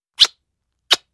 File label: Kiss; kissing